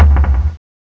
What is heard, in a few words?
TOM; CMI Sound Library Rev 1.3